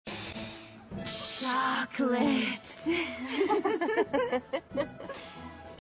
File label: laugh; frozen cholocalte; audio meme